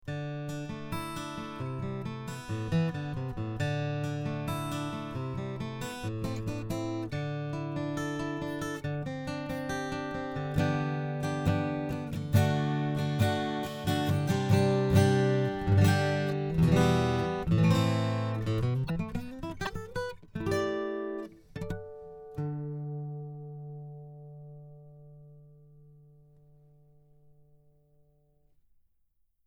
Stock ebony pins, acoustic guitar

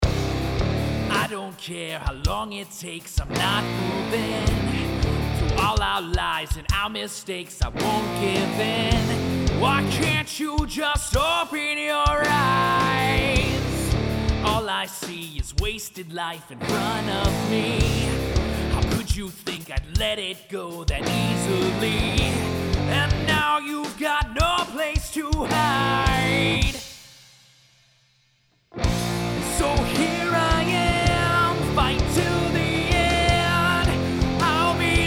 Track Antelope C800G, virtual mic

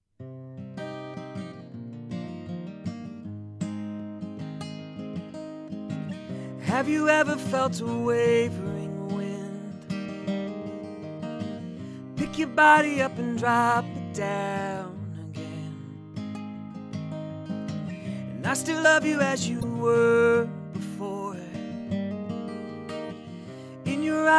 Music Blue Yeti Stereo; usb mic

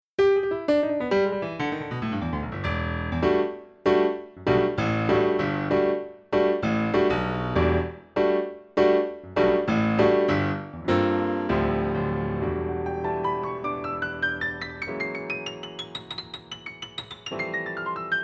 Ivory German Concert D Grand, virtual piano

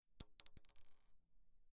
Pindrop ebony, acoustic guitar

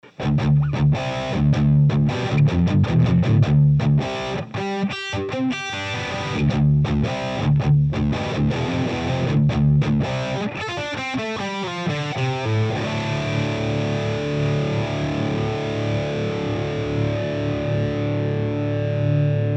414xlii dirty, guitar amp; guitar amp mics

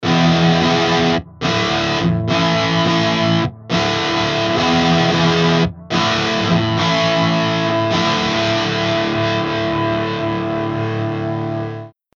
amp sim audio sample; amp sims

